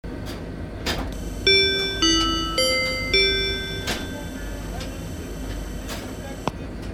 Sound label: chime; tobutetsudo